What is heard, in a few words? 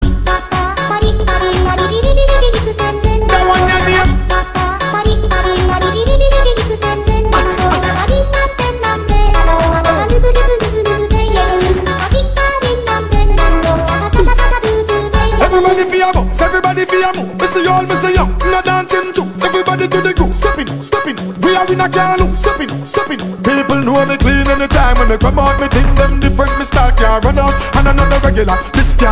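Riddim, ringtones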